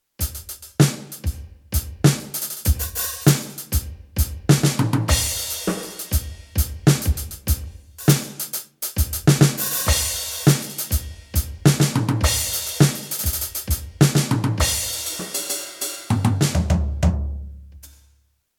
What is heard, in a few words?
Hitman Mako Acoustic